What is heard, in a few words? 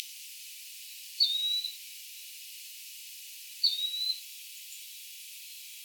Charadrius vociferus; ecologia paisaje sonoro